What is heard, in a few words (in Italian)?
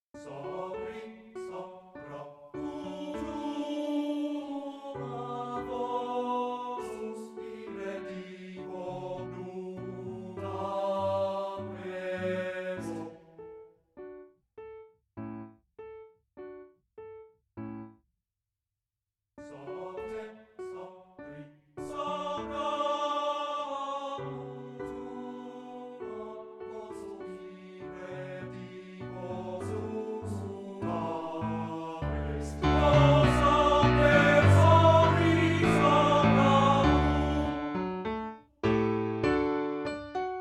Lied per voce e pianoforte; Rosetti; Der glueliche Bauer